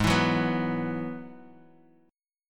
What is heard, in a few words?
Ab Minor 7th Sharp 5th Ab, Guitar Open D; 6,7,4,5,x,x; down